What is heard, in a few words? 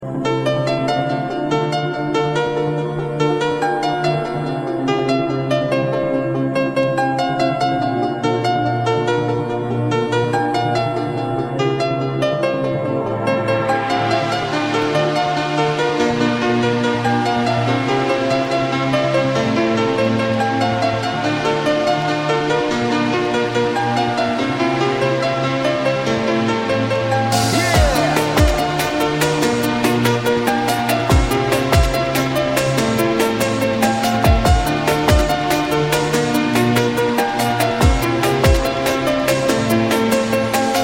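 beautiful ringtone melody; Красивая мелодия на звонок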